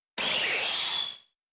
Grito de Dracozolt

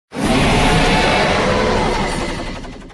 Grito de Eternatus Gigamax

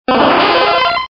Grito de Starmie

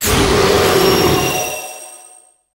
Grito de Enamorus tótem

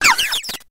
Grito; Nymble

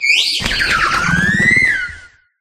Grito de Fezandipiti

